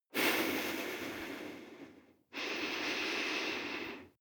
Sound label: breath female nose